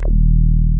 HOUSE BASS; Masterbits; Dance Trax

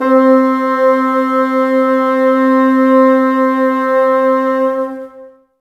Bassoons C 4; Miroslav Vitous - Woodwind Brass Ensemble

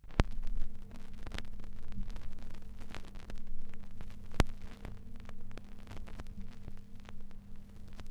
Crackle, Vinyl Noise

